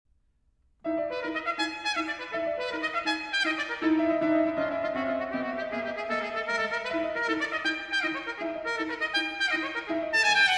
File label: for Trumpet and Piano; Tomasi Triptyque; III. Salterelle